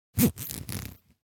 Zipper Open Close Up - Two Short Open And Close - Stereo Pan Field; Foley Cloth Movement; Hollywood Edge; sound designer's toolkit v2